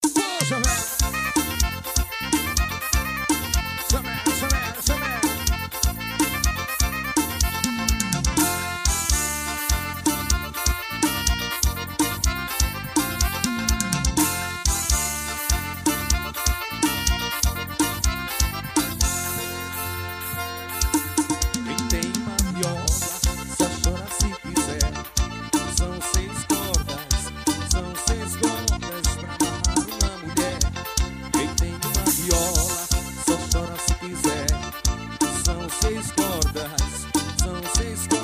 seis cordas

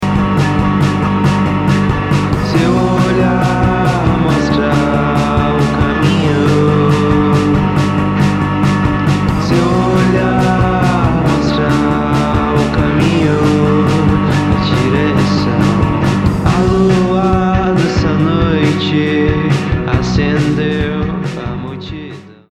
indie rock autoral

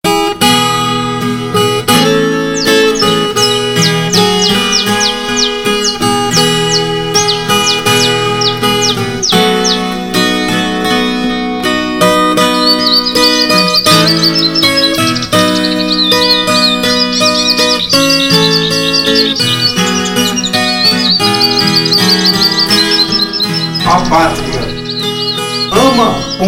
trilha

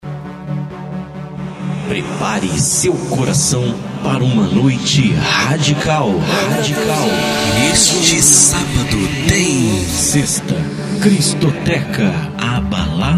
vinheta; cristoteca

